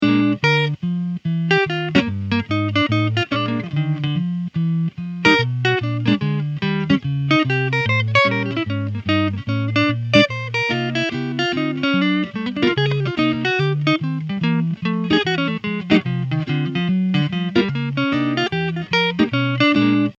sound sample jazz with lead

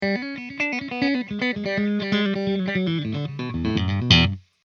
sound sample tele riff

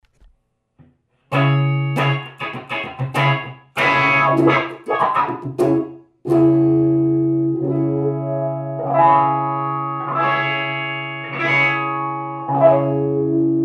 wah wah sound sample

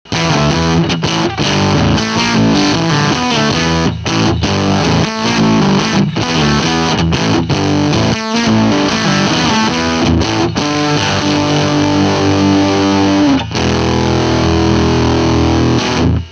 heavy riff

